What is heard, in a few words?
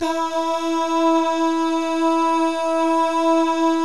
DAH, Vocal Jazz; ILIO